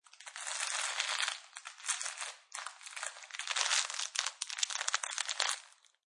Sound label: Plastic Wrapper Crinkling; Plastic food wrapping or package such as chips or cookies crinkle sounds; Crinkling Sound Effects